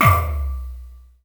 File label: Kick, Drum Machines